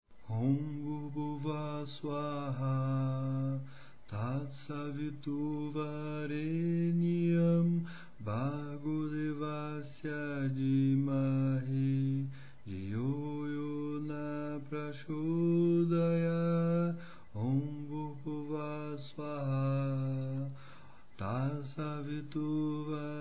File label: Gayatri